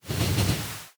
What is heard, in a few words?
train tie; factorio 2.0.55